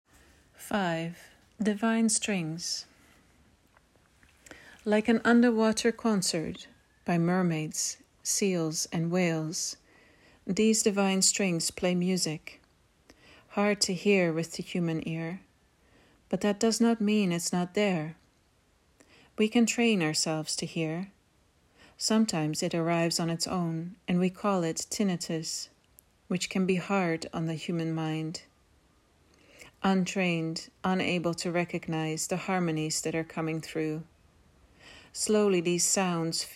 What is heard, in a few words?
divine strings